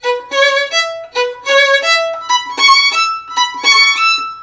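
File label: VIOLIN LOOP, Asian Traditions; USB Soundscan vol.60; AKAI; VIOLIN LP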